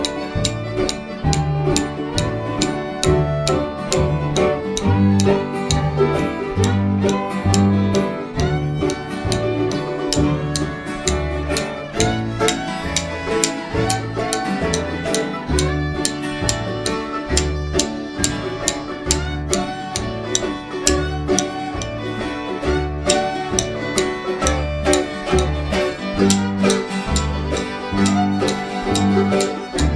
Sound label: jamming at birthday party